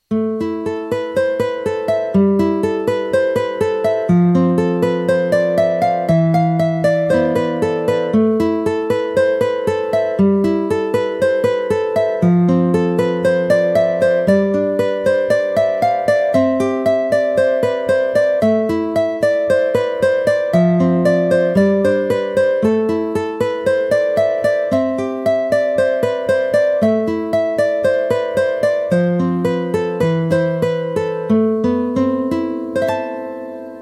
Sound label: Autumn Wind